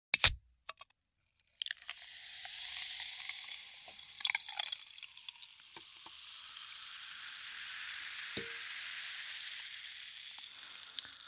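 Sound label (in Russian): открывание наливание питье и выдох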